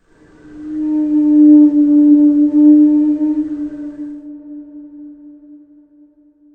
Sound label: Forest Amb